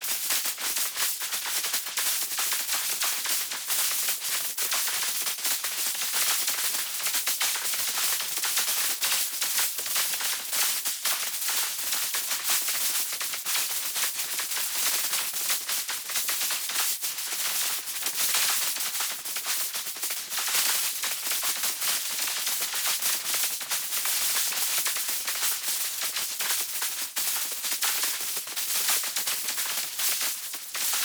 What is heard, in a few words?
MAGIC ENERGY Texture, Complex, Particles, Zap, Sparks, Short-Circuit, High, Sweetener; Articulated Sounds; Magic Elements vol.2